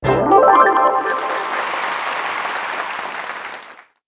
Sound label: you win